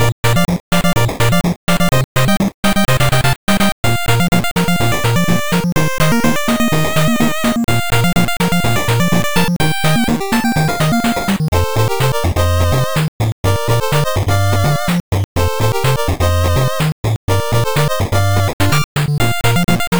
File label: plains stage bpm125, 8bit music for action game. This music is loopable.